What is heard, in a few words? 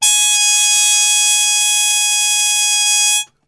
G#3, Brass Super Section TRP HARMUTE; Best Service; 90 s Sample CDs